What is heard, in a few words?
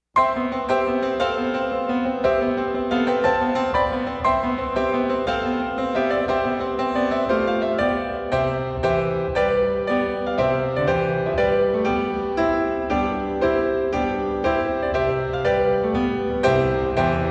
Piano; Trumpet Tune